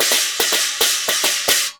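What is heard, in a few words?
CHINA, China cymbal; Spectrasonics; Heart of Asia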